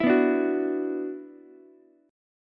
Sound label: Gb 7th Suspended 4th Sharp 5th Gb down Ukulele; strummed; JGuitar